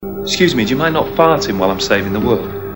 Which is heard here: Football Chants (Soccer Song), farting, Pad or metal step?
farting